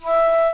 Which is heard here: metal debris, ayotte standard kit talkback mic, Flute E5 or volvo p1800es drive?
Flute E5